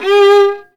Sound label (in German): GEIGE; Best Service; Alpine Volksmusik